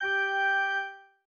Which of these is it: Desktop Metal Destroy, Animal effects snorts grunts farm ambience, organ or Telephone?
organ